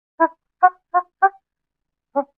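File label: monkey idle normal; ambience avant gardens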